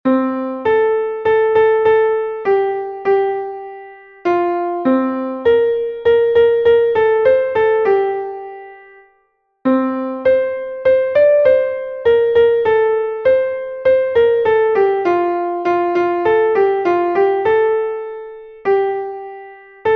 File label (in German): es waren zwei koenigskinder, klavier